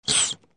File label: Air compressor